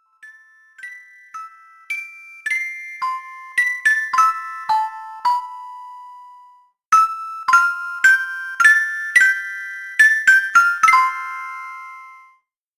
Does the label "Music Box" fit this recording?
Yes